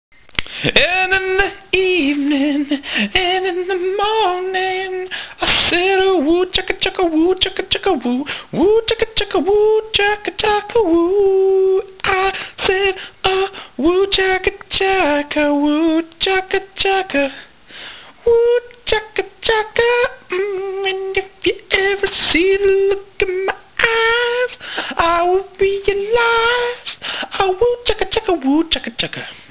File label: woo